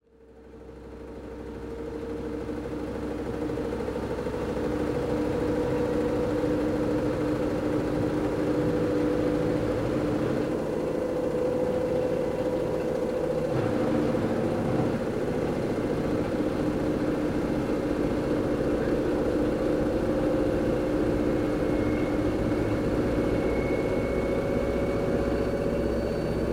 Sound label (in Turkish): camasir makinesi sikma sesi